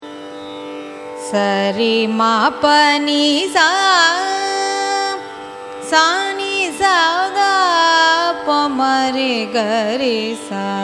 raga atana arohanam avarohanam; carnatic music

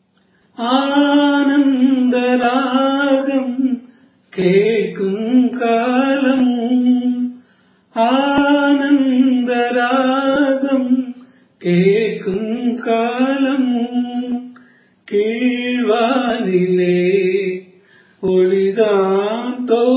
raga simhendramadhyamam cine; carnatic music

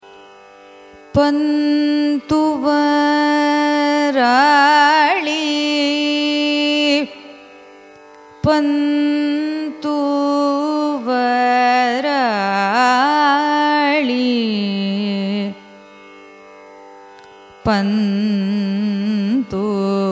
raga pantuvarali signature; carnatic music

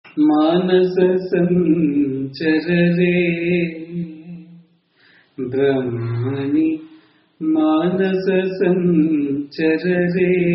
raga sama cine

